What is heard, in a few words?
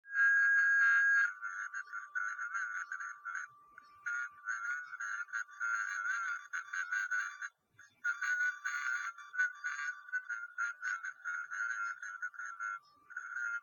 noise